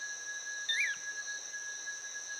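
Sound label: Manakin, bird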